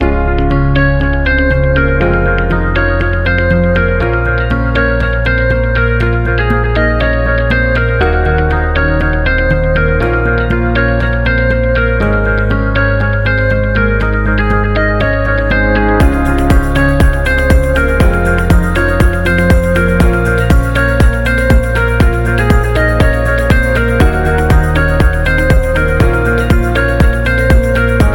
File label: Happy